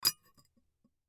Bowl Empty Remove, Bowl Empty Remove Spoon Scrape Dish; Household; Dishware; Blastwave